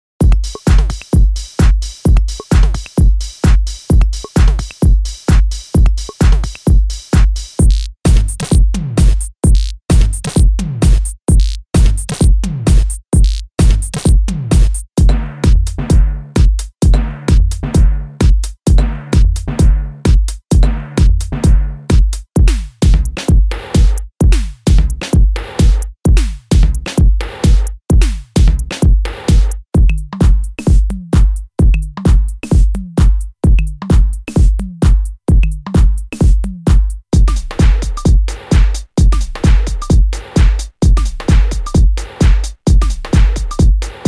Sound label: Groove Trance